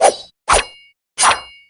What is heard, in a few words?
slash